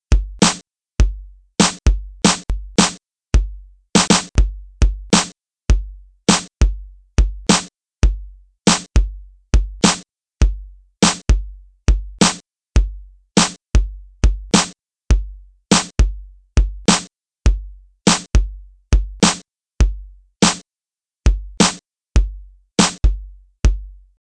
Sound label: drums